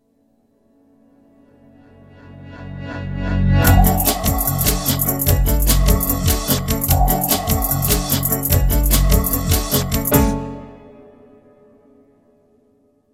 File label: Minimal Ad, MINIMAL